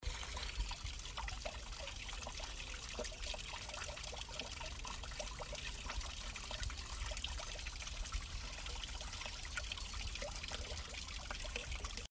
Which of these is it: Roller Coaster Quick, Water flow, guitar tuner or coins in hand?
Water flow